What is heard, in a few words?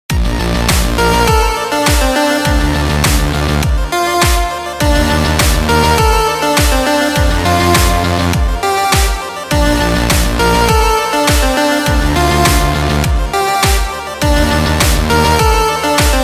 music Menu; Sokoban